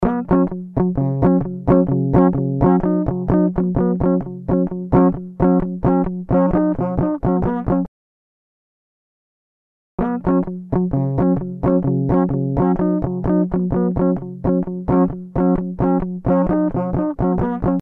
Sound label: Before and after compressor